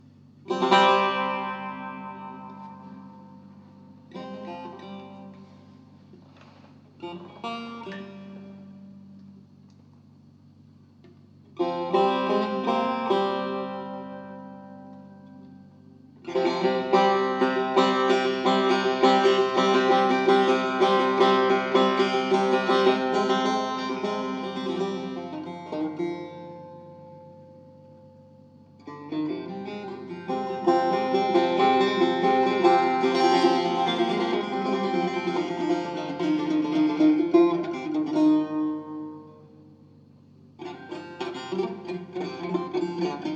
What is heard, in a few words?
Improvisation In Nava